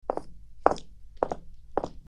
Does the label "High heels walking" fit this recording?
Yes